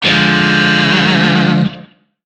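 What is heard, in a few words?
Shawzin Minor Chord B; Music; DE; Warframe; Lizzie Shawzin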